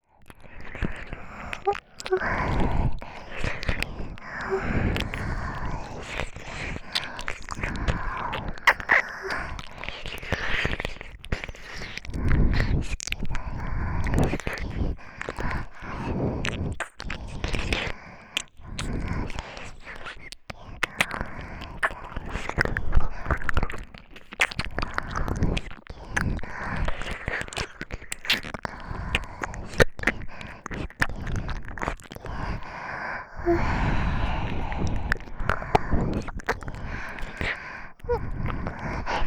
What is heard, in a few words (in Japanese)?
すきすき密着囁き耳舐め; ASMR; 各トラックの両耳Verのみ抜粋ファイル